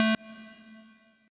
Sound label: sq lead